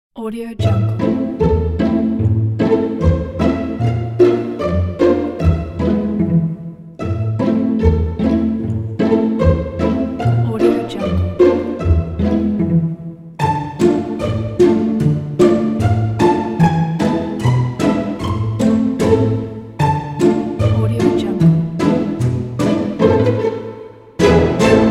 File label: cinematic comedy